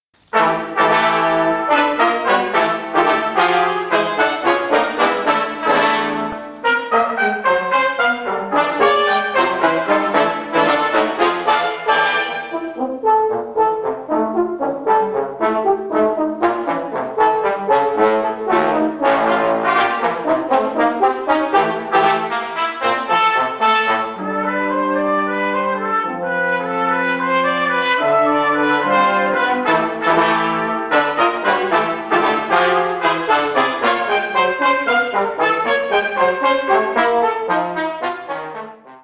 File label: Grungers for Brass Fanfare